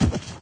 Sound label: body fall; There; ThereClient